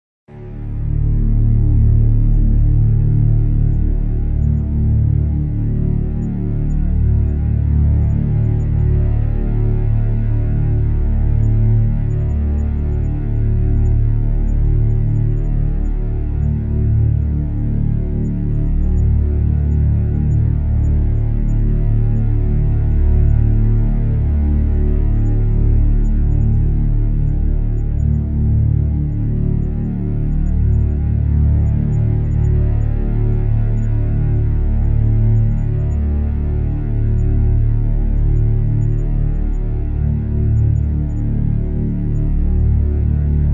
Drn Apex (D); Brand X Music; Brand X Music Catalogue - Editor's Essentials

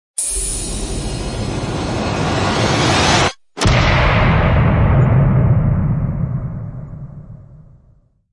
Sweep; Gothic Storm Music; Brain Warp (Sound Design Trailer Tools)

